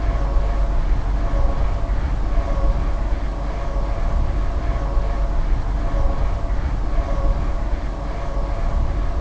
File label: power generator